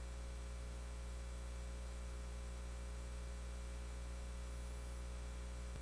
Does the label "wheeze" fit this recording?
Yes